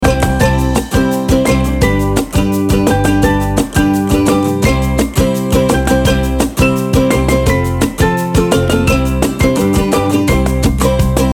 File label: Happy Birthday (Upbeat) Loop